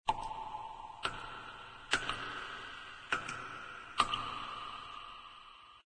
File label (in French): goutes grotte, ambience